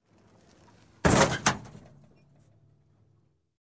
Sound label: Car Crash